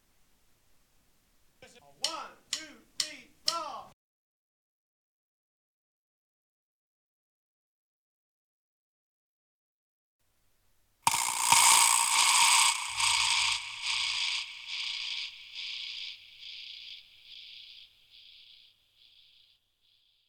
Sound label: Keys